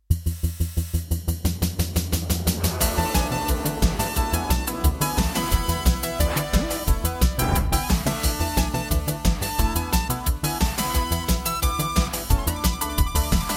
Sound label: Roland MT32ROM; Willy Beamish